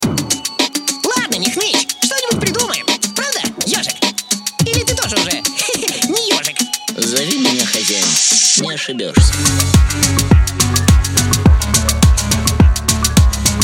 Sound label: phonk